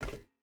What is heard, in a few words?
footstep burlap metal run; Rust